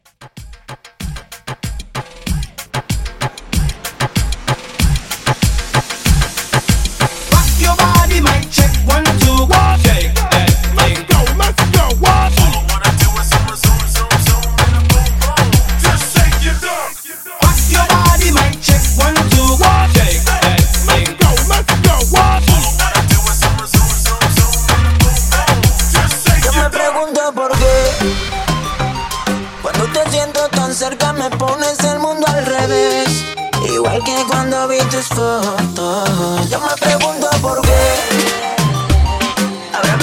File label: Reggaeton Hype Re Drum 95